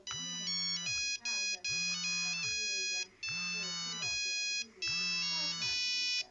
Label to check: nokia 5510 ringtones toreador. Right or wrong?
Right